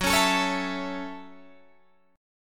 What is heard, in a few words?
F sharp Minor 7th F sharp, Guitar Open D